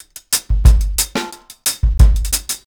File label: RG90 DRUM, REGGAE